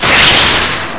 nitro

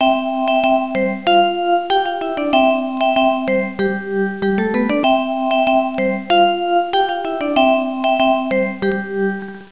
HAPPY; Education Publishing Concepts; NOAHDEMO

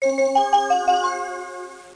CHIME; PUPPIES

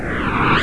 duct suck

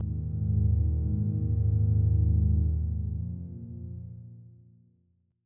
2 BELL

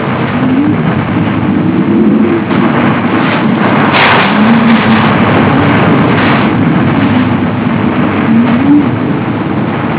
Nature Hurricane; Serenesound

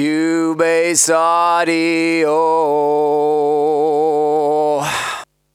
voc

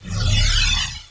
spawn, misc; chaos

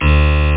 SYNTH LEAD; CDTV Lemmings

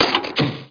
PITCH

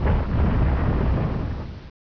Explosion Near; F/A-18 Hornet 2.0

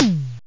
tom drum; The Epic Collection 3; Epic Marketing; muzak samples

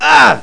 FOOH

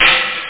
hardtrance hihat tr909

